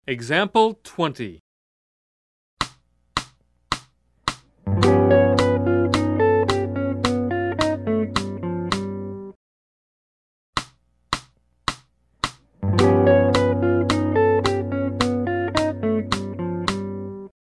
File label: Jazz